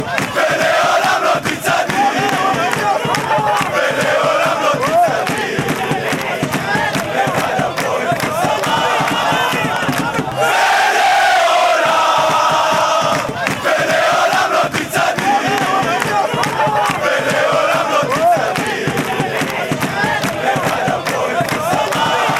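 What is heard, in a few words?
fanchants; kfar saba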